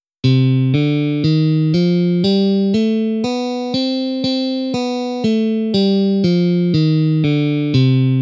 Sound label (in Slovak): stupnice elektricka gitara melodicka mol vzostupna